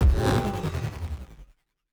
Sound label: ferro wave connect